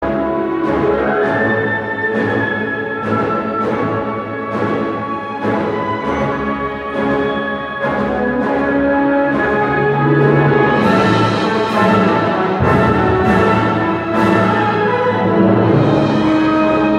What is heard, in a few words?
Passion, Orchestra Instrumental Version; World Tour